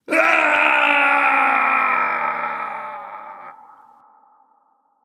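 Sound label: non verbal fear, voice; cernunnos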